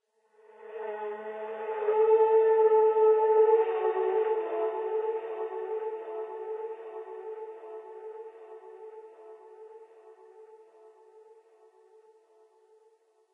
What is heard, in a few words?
strange sound; STALZONE; minecraft